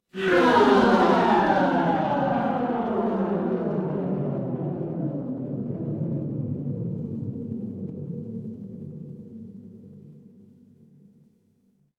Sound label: crowd gasp